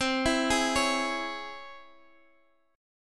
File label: Db Minor Major 7th C